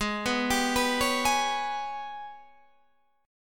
G sharp Minor Major 7th Double Flat 5th, Guitar Standard; arpeggiated; JGuitar